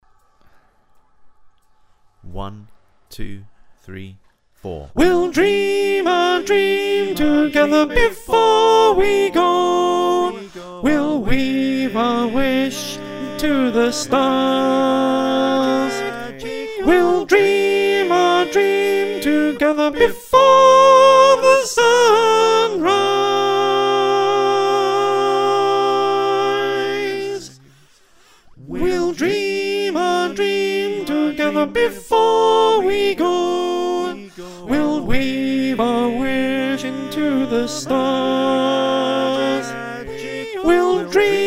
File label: Soprano, choir